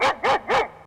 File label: Dog